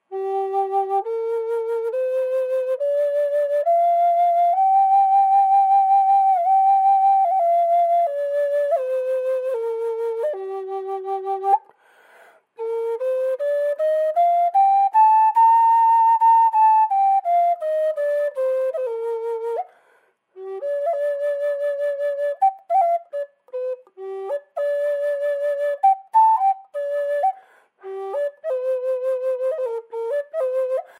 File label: Gm Backpack Unb Processed, flutes